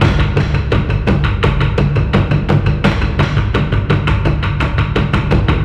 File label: horde; music